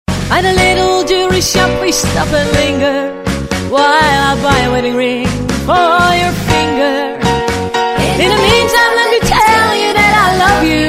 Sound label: Rock nd Roll